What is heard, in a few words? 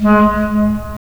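wind clarinet; Roland - LA Composer vol. 3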